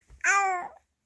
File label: Awoo